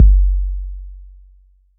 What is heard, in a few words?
Kick 808 Tone; gate experiments